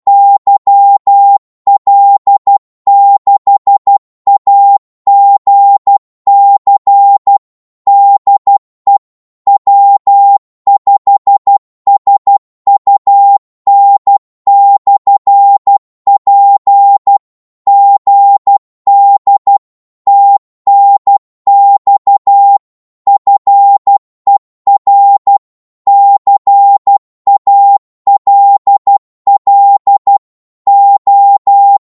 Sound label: qso 12wpm, Morse